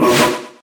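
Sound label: Dullahand attack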